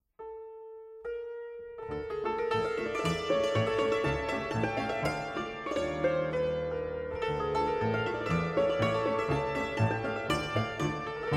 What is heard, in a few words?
Belorussian polka